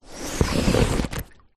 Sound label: boat rubber dinghy small float side rub on object